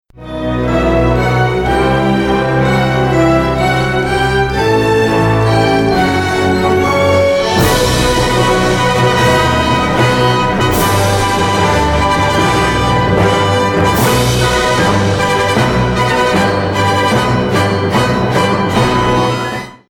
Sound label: fanfare